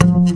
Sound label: Upright Bass Notes; multisampled